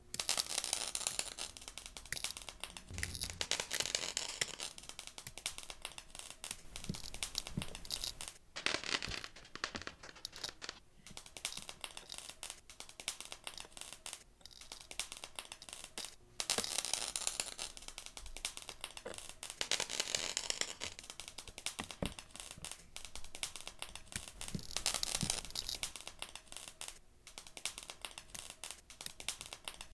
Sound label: Fuse box sparking; Phasmophobia